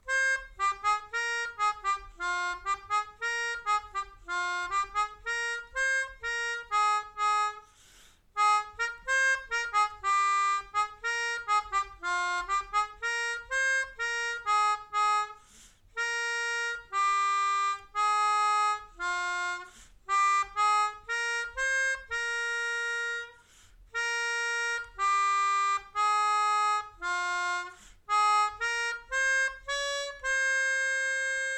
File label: Melodica